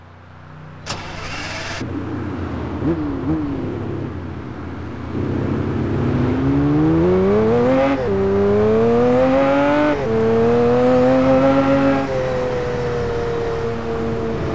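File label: lamborghini murcielago coupe, car; TDU-1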